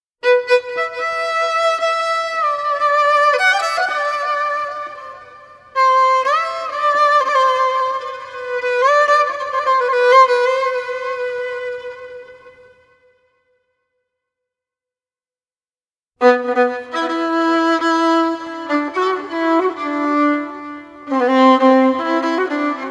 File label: Instrumental (Violin)